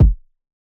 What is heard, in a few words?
Kick